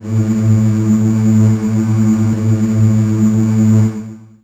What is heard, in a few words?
FAIRLIGHT, Retro Sampler; Best Service ProSamples vol.55